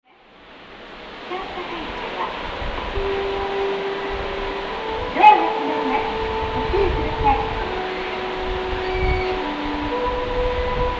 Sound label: melody, station